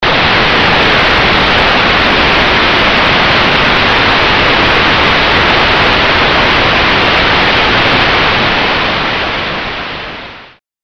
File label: Raging Static; EarthBound; Giygas'